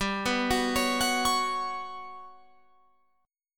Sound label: G Major 7th Flat 5th, Guitar Open F; GM7b5; arpeggiated; 19,18,18,20,21,20